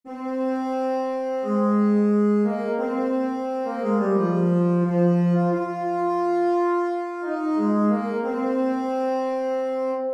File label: tenor sax